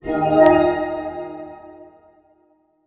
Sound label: Level Transition In